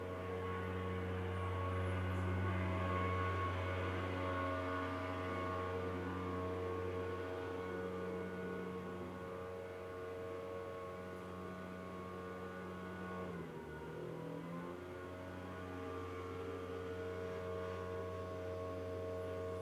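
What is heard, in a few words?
Leaf Blower interior perspective; Blackmagic Fairlight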